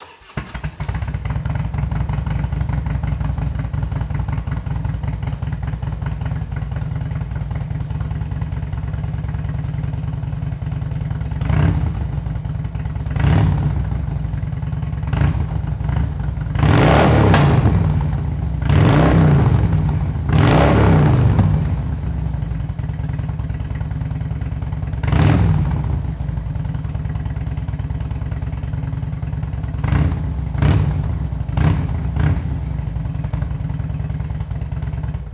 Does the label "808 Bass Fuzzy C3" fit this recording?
No